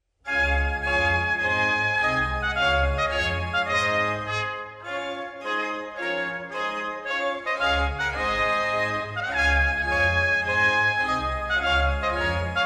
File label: Trumpet Tune